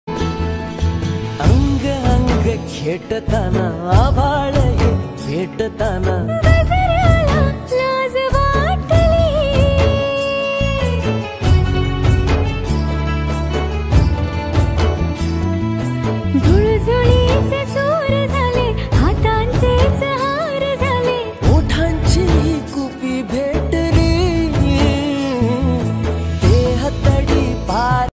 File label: marathi song